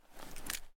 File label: Glock Draw